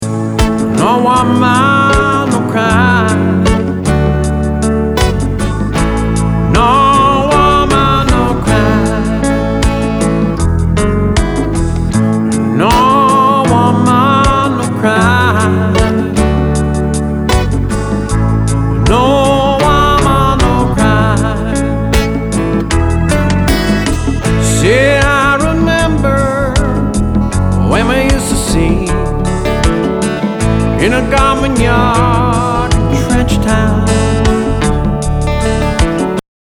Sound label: ballad n blues